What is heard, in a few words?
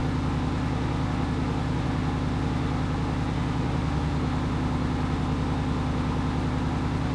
engines; World of Tanks